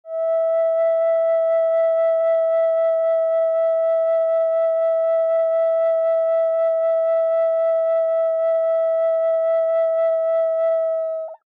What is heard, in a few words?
Small E, Flutes Single Note